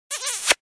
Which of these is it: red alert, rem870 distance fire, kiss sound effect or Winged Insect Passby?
kiss sound effect